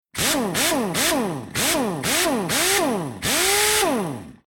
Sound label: Industrial Air Tool Pneumatic Power Wrench Wheel Nut Tightner Mechanical Variation Pulsing